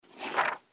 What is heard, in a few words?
Paper Fly In